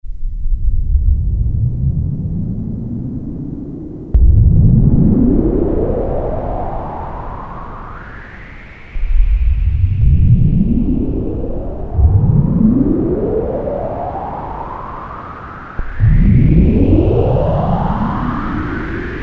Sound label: SOLAR WINDS, Roland Jupiter-8; Factory patches